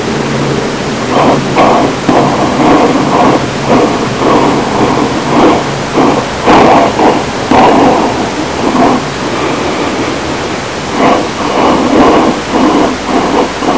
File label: recorded with fm transmitter